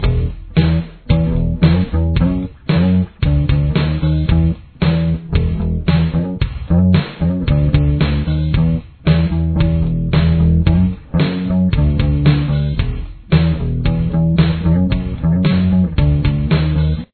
solo bass

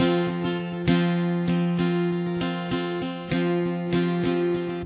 chorus, guitar

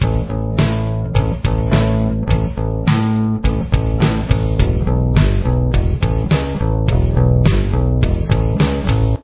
verse part 3 bass

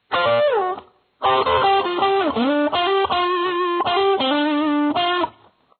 solo

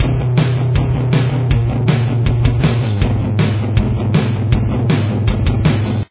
verse guitar

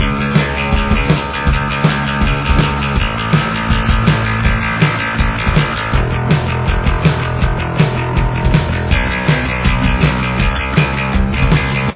bass

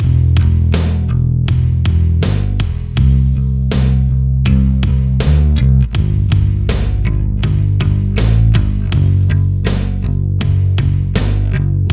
bass

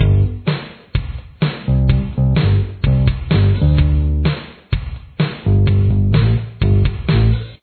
verse bass

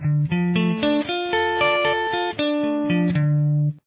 Arpeggio Gm style, guitar

